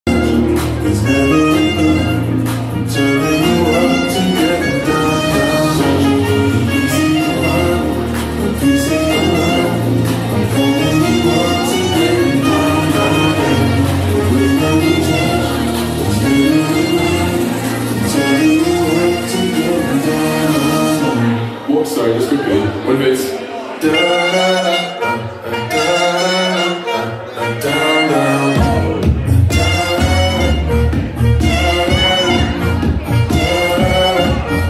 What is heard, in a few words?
sax, live